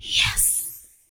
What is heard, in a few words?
VOX, VOCALS; Ueberschall; Jam Box